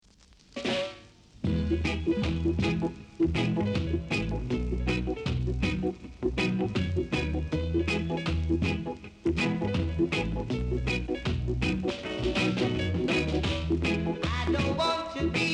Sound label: beat